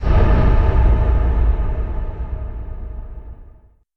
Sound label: game over sting